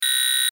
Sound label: buzz beep digital; Multimedia; Buttons; Blastwave